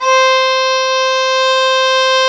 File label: STR VIOLA, Str Quartet, Strings